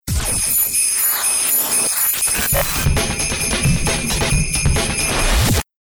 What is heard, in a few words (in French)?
Base rythmé